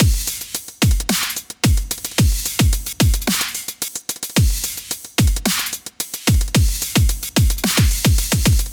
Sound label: drums; Rhythm Quest; rhythm-based